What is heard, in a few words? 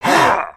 Troll Groan